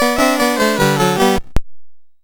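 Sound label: Pancho, MOS 6581 SID chip music, games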